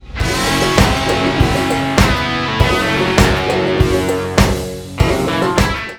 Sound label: country